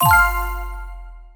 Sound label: correct Answer